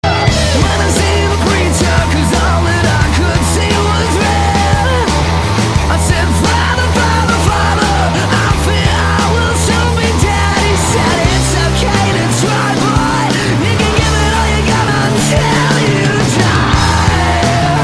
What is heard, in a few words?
Chest Pains